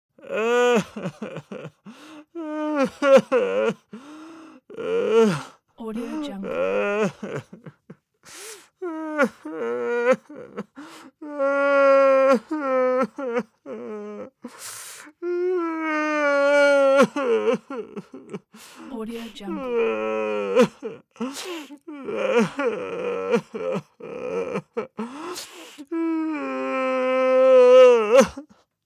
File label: male crying moderate